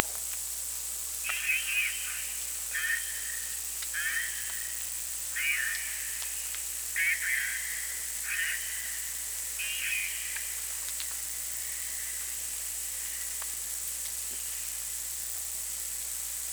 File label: SONAR